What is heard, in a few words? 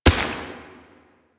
Step Water, Footsteps